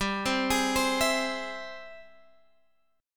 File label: G sharp Augmented Major 7th G; G#+M7/G arpeggiated